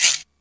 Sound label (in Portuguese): CRECEL; East West - Global Sounds; AFR.BRAZ